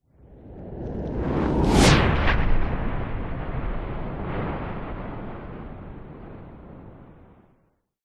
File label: sound design cinematic whoosh powerful into a thundercrack tail